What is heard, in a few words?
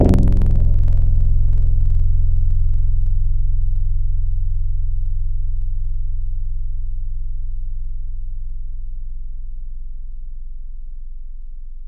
Knock, Tropical Mallets; Synthetic's Serum Collection; SparkPackers